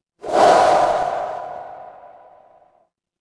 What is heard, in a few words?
transition swoosh